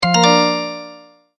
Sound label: multimedia tone start; Sound Design; Multimedia Tone-Start 27; McKinney Sound